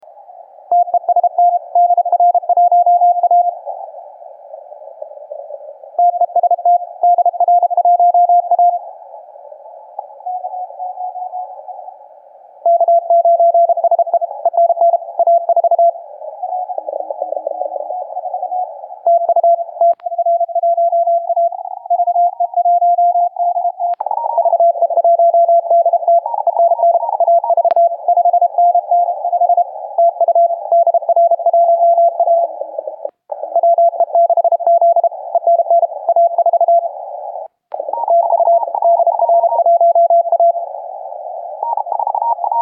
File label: cqww cw